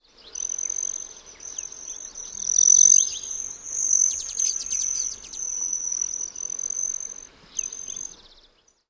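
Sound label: Broad-tailed Hummingbird trills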